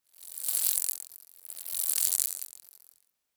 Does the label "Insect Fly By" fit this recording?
Yes